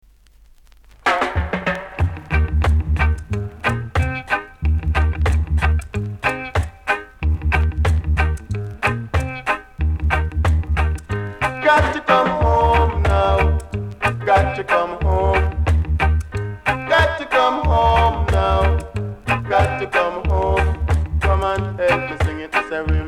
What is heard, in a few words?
rimbimbam